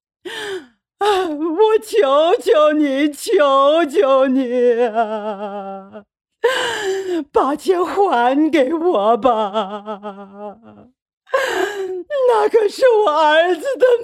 65岁老奶奶哭喊; 角色

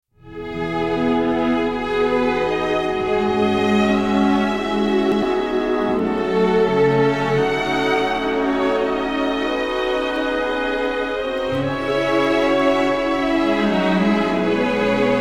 电影音乐; 奥斯卡电影音乐

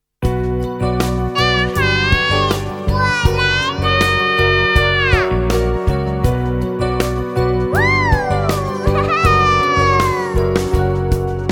歌曲翻唱

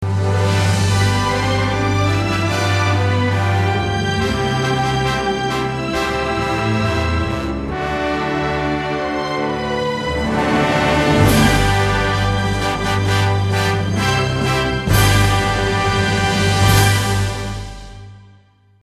片头音乐

